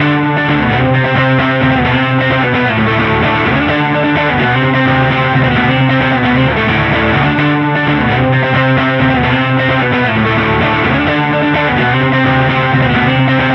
Electric Guitar trailer loop, Cinematic Trailer Loops